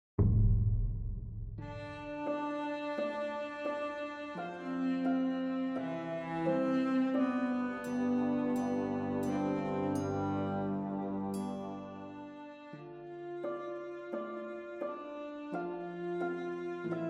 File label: Loop Somber